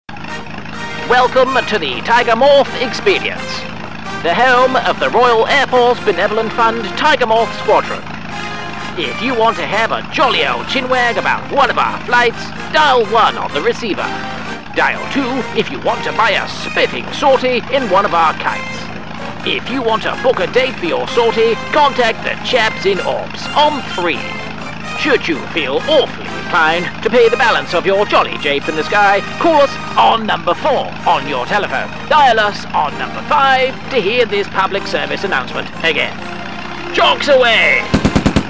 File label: tiger moth on hold